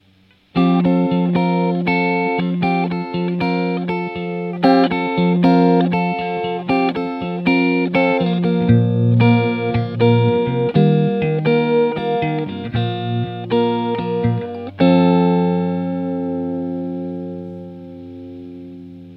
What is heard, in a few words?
sine, chorus vibrato; jhs emperor v2 chorus vibrato; pedals